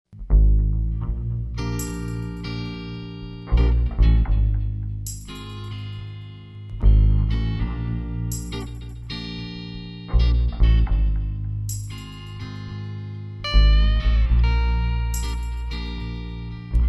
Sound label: optical pickup bass and guitars